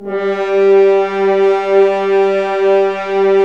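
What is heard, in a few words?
BRS, French Horns Dry f; Roland L-CDX-03 Disk 2; F.Horns 2 f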